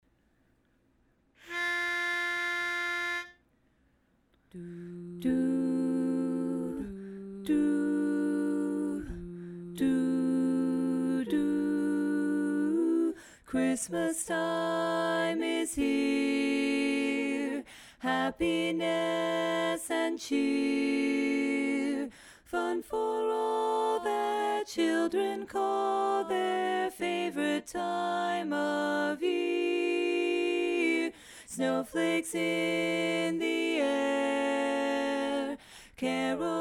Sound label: Bari Dominant